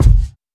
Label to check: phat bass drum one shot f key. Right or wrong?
Right